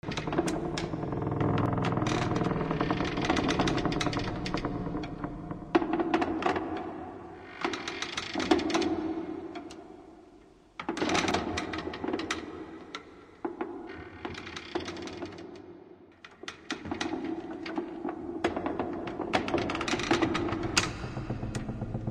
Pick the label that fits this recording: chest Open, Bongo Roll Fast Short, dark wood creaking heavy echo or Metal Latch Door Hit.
dark wood creaking heavy echo